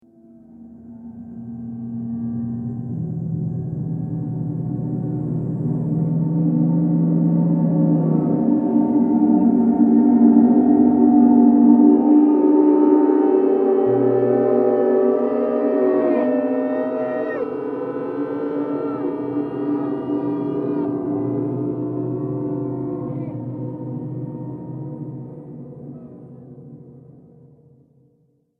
Purgatory Soundscape, Scary Soundscape Sound Effects